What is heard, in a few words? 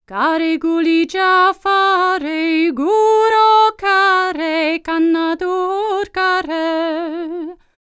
NEAR EAST, Vocal World Voices; ILIO; Vocal Planet VOL-5